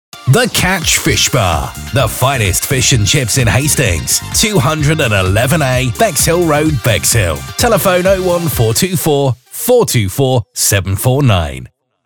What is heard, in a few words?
chip shop jingle; Bexhill Radio